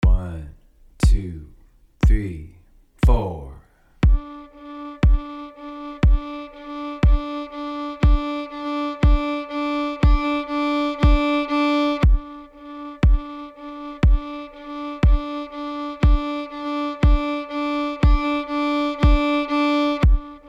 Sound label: soft loud soft D, fiddle